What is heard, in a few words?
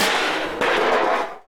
basin fall